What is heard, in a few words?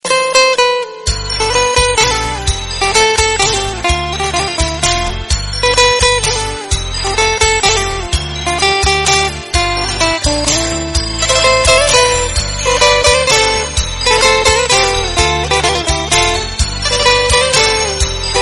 fresh romantic musical message tone